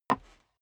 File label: step boots wood creak single stair up; schritte